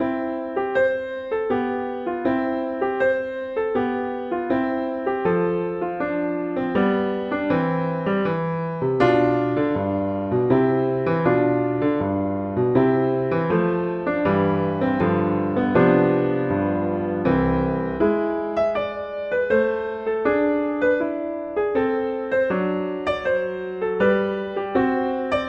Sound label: Sonatina, Allegretto; Clementi; Sonatinas